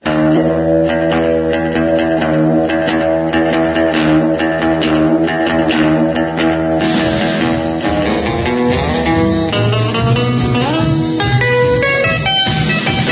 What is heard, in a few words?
bass